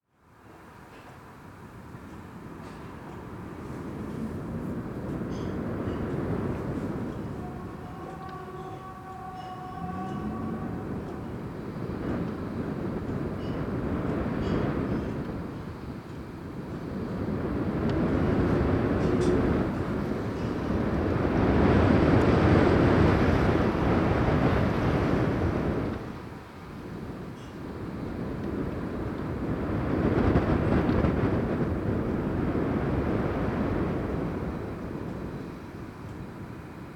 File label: Wind Blows